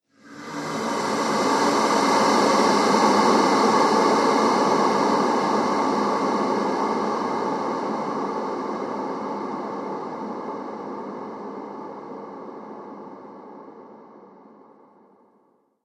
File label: Zombie Ambience Drone; horror; Zomby